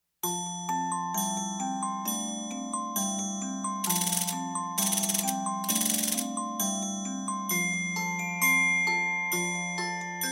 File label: Music Box Audition Accomp